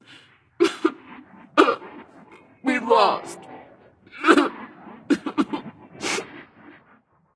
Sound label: boohoo; smite; voicepack; hel